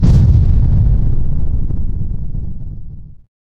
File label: combat explosion; geoscape